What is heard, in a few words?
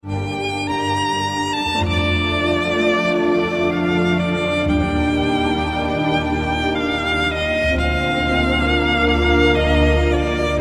Love Theme Violin